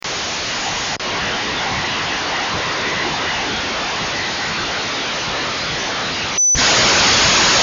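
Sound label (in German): des xl encrypted, Motorola Verschlüsselung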